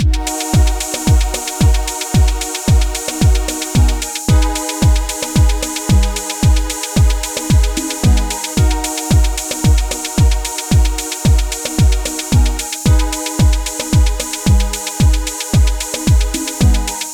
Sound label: Amapiano drums and synths 112